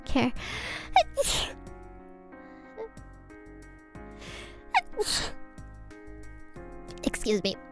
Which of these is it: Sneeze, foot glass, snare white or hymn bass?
Sneeze